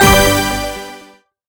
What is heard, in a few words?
win small drop; dazzle